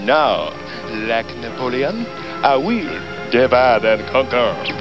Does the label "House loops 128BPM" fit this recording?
No